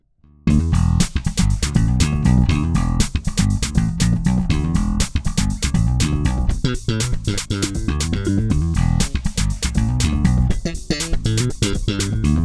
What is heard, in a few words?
FB Slap